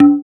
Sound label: Tablas; Spectrasonics; Supreme Beats; World Dance